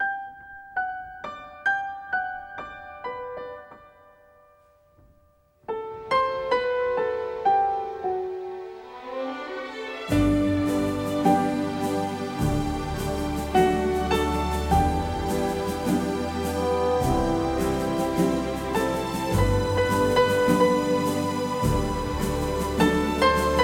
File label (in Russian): БАРАБАН